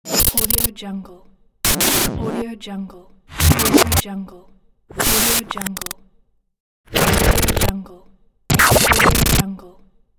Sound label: glitch transition pack